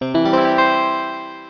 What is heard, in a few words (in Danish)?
Plingeling